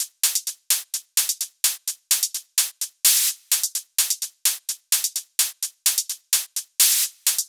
128BPM Simple Kit; VFH1 128BPM The Simple Kit

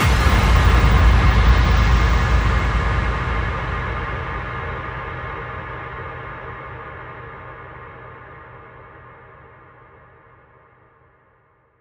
Bassdrums FX, FX Kicks; Vengeance Samples

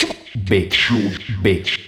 House Loops, Vocal Loops House 128BPM

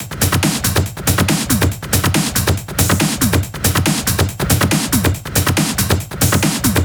140BPM Genetic Kit

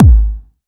Bassdrums UK Trance Kicks

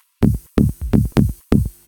House loops 128BPM; Vengeance Samples

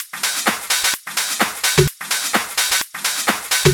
House Loop, 128 BPM; Vengeance Samples

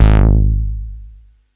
Bass Juno G#; Vengeance Samples

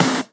snares; Vengeance Samples

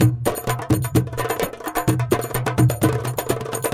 Latin House Loop 128 BPM; Vengeance Samples

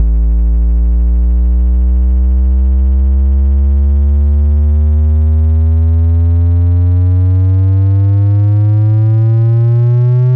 Sweeps Long

